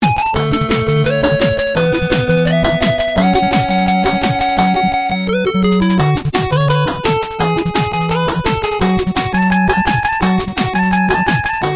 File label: 8bit